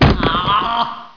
pump deploy, weapons

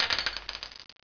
ground, weapons

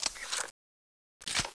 generic reload, weapons

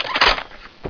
g36c Mag Out

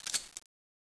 usp slide release

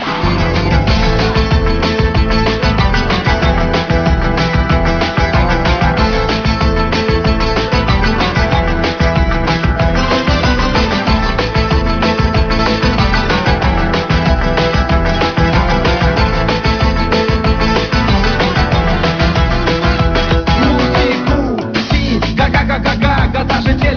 rock uzbek music

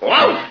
gav

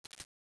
p228 slide pull

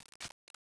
mp5 clip in

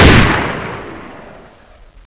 acr shoot, weapons

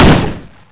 mp7a1 shoot

